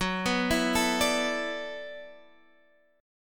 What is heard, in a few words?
E 7th Suspended 2nd Suspended 4th F sharp, guitar